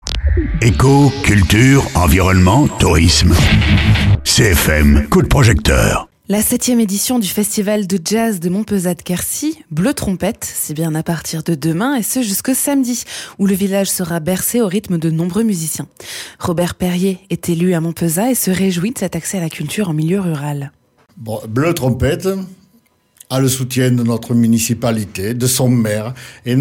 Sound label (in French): Bleu trompette